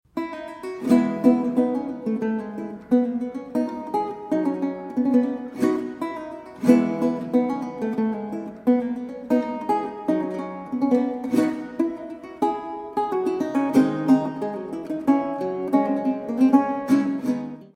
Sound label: Roncalli Gavotta